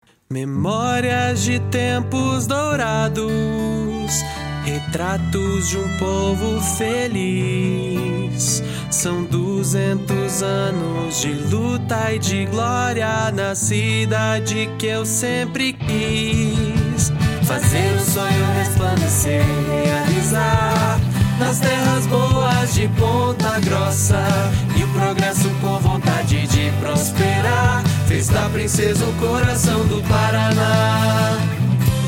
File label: jingle